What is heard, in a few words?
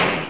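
pistol; CD Action 47a 2000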